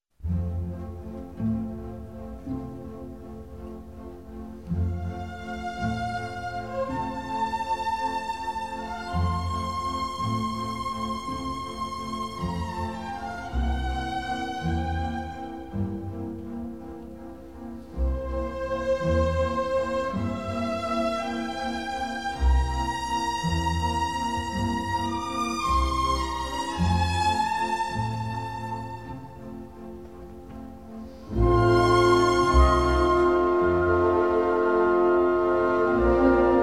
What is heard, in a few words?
Piano Concerto No. 21 in C major; Wolfgang Amadeus Mozart; Mozart Greatest Hits